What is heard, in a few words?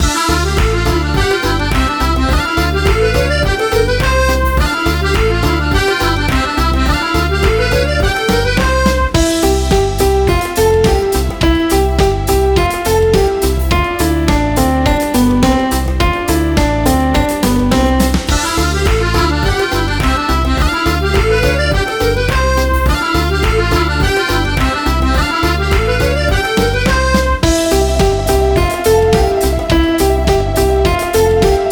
karaoke